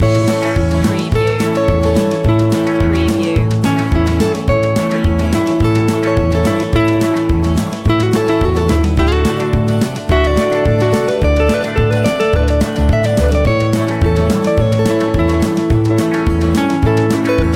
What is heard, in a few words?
on the range loop